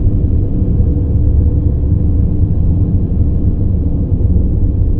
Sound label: station ambi; Earth and Beyond; EA Games